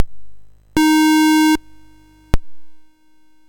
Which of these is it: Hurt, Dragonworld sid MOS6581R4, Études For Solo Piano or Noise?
Dragonworld sid MOS6581R4